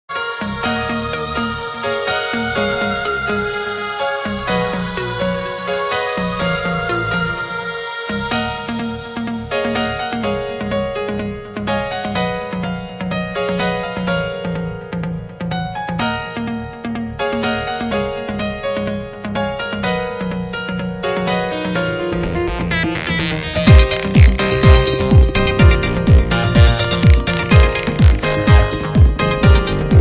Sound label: Commercial